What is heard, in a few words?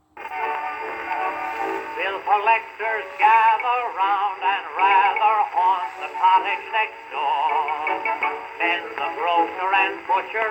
Exceptionally nice Victor IV with wooden horn